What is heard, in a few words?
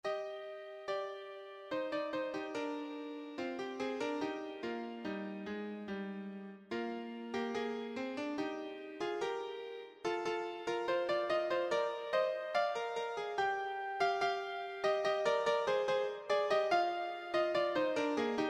audio sample piano